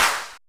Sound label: Snare Clap Low